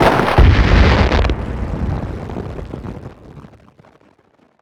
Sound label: explosion, Spaceship; Bluezone Corporation